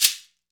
SHEKERE, Latin Shakers

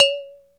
Bottle C#3; Planet Earth; Digital Sound Factory Planet Earth

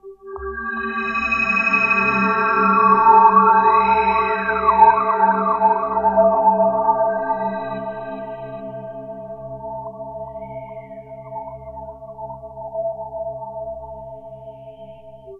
Breathing Pad; Sonic Foundry (Sony Creative Software); Loop Noir

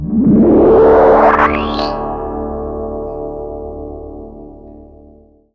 warbles

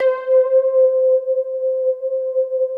MARIMLOG, JV PADS; Pro-Rec - Synthsonic

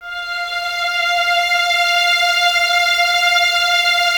F 5, String Ensembles Violins; Miroslav Vitous; 11 Violins

